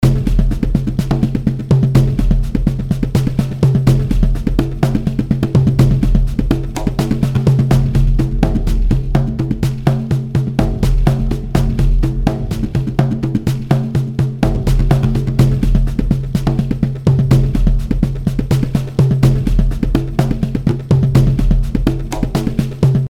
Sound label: action drums 125bpm; crysis warhead